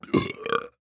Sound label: burp; guybrush